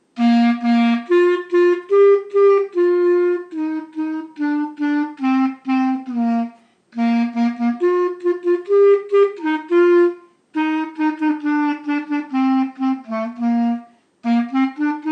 playing the clarinet variations on a familiar theme